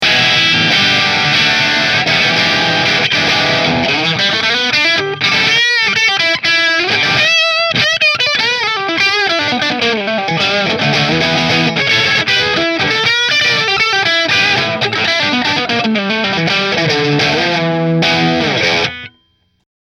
Warrior Satin Position 3 Marshall, guitar; Warrior Guitars Dran Michael Natural Satin

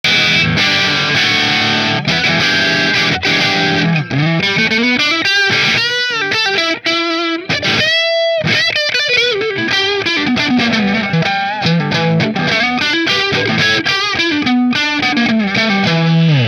Mcnaught neck marshall, guitars; McNaught Custom Guitars Vintage Single Cut Black Back

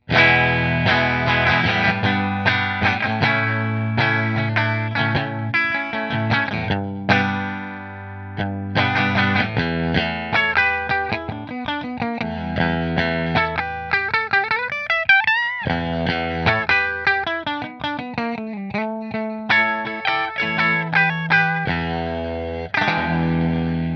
Grosh Strat Position 3 Fender

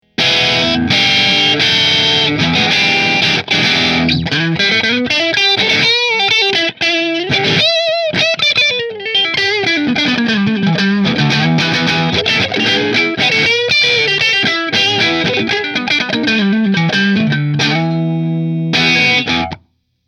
Nola Model 8 Natural Middle Marshall; New Orleans Guitars Model-8 Natural Finish

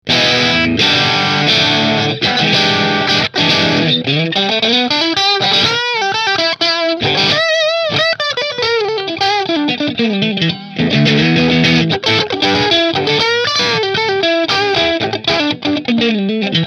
Position 1 Marshall; Rahan Guitars RP Double Cutaway Green Flame Maple Top

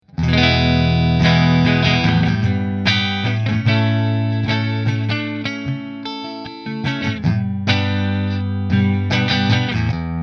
Nola JB14 Flame Neck Split Fender

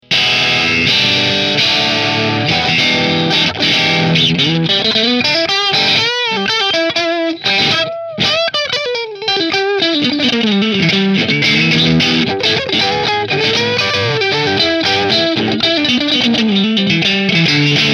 Nola Quilt Bridge Middle Middle Marshall; New Orleans Guitars Voodoo Custom Quilt Top

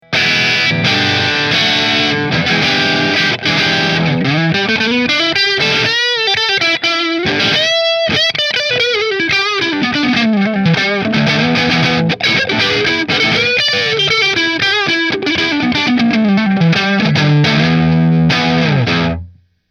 Nola JB14 Custom Neck Middle Marshall, guitars

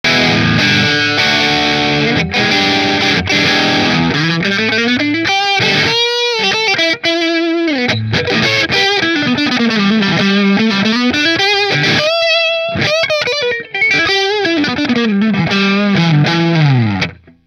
Robin Avalon middle marshall

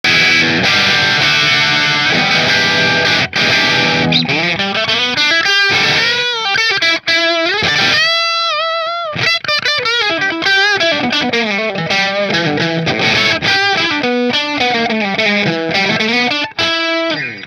Nola Voodoo natural bridge marshall

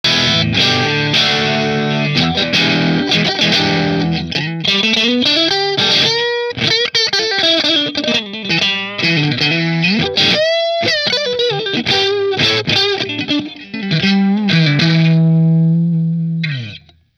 Custom shop strat position 2 marshall, guitars

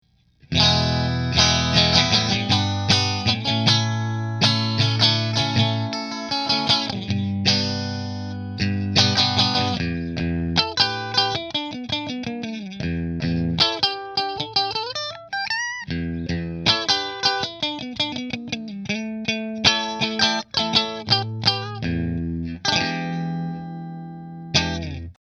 Partscaster Position 3 Fender, guitars; Fender Partscaster Stratocaster Custom Relic

